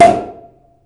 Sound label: POP; tempest 4000